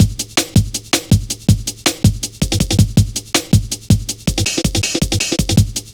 Drum Loops, Jungle

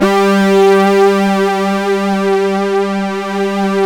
SYNTH PAD; Sound Gallery - Charged; SYNTH PAD 1

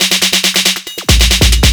drum 138, drum-loops, 2bass, Techno

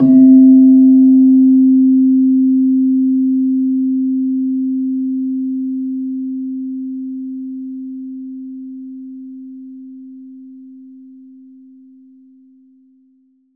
PELOG C2, PELOG BASS; Soundscan vol.60; AKAI

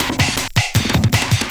Drum & Bass, 160 BPM

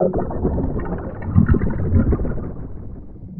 Liquid Speakers; Sonic Foundry (Sony Creative Software); Ma Ja Le Ethereal Textures

